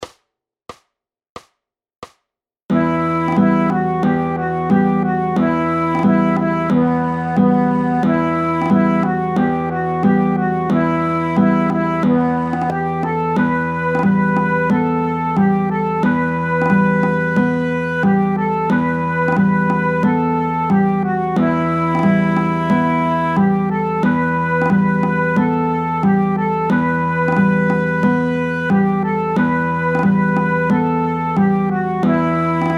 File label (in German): Sum gali playalong